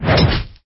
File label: Attack